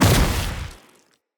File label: Shrimp Lantern Weap Cluster Proj Split B; Creatures; Baliq